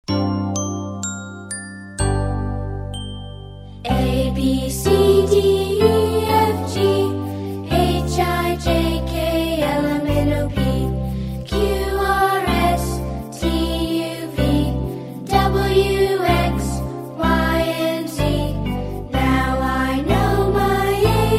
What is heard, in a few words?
Alphabet